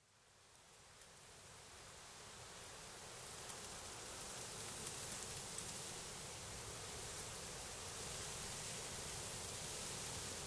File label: wind; Zomboid; The Calm Before The Storm